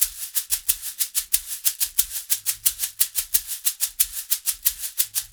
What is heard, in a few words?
shak, percussion loops